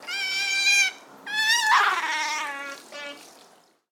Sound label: sea otter; animal sounds